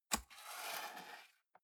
cardboard box close fold scrape single; objekte

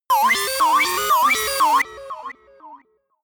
shot futuristic wobble ringing; digital; soundpool

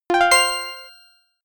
feedback positive, signale

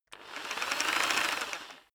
rock drill hammer rev long soft; maschinen; bohrer; soundpool

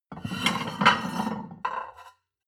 plate on plate take multiple, restaurant cafe

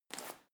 medicine ball throw; sport freizeit; fitnessstudio

